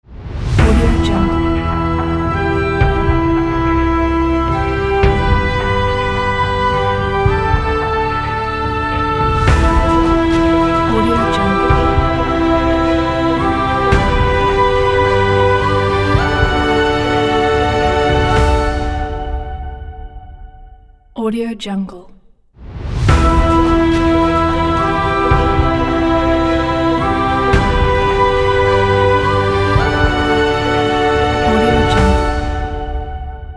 cinematic logo ident

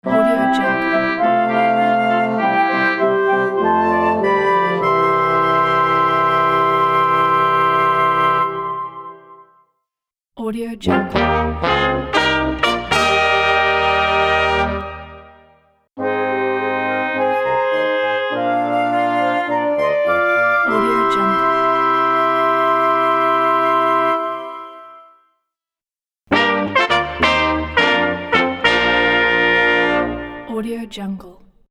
classical logo pack

